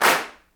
CLAPS, Africa Handclaps

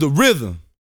VOCAL F; ILIO

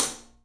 Studio Hats, 3DPercussives; E-MU Producer Series Vol. 5 – 3-D Audio Collection

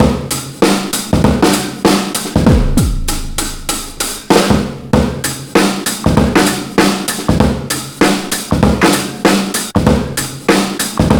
97.2 bpm, Breaks; BONITA; POTBreaks

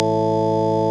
CLICKY A1, clicky organ

